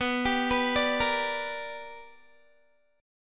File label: B Minor Major 7th Sharp 5th Guitar; BmM7#5 arpeggiated